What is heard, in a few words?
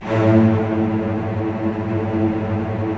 STR VCS; Roland - LA Composer vol. 4